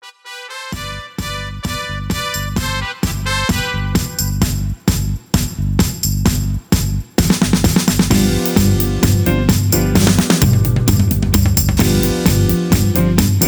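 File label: No Leads Breaks only background vocals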